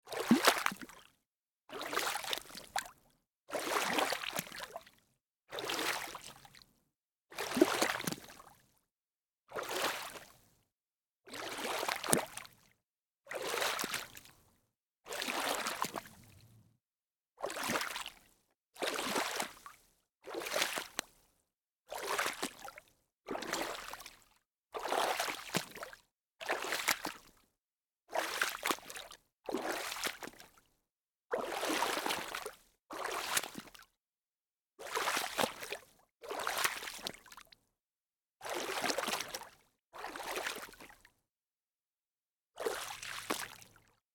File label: boating water splashing; Debarup Travel & Films